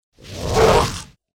bad monster whoosh

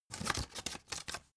cd cover booklet in

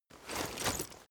leather metal walk slow single

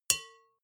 bowl hit soft

